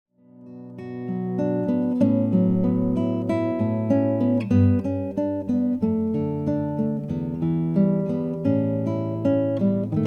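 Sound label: mcgill picasso cocobolo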